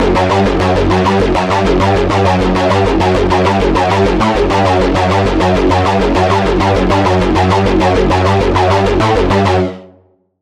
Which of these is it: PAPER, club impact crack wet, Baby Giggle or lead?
lead